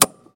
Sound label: Push Button Switch